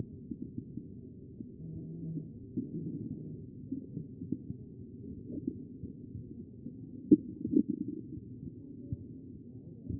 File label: chest; B MODE